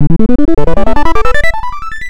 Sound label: shield kill; mother2